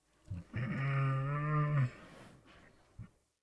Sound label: Wolf Idle Friendly Moan, Animals Wolf Vocals